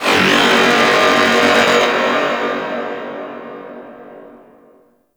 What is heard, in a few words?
Outpost Creature Robotic; Warframe; Corpus